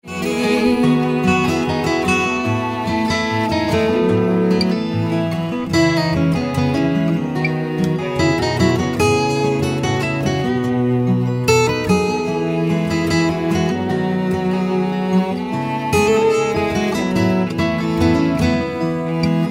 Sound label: instrumental solo